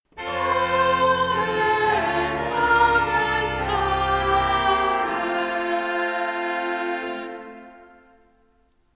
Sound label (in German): Liturgie Amen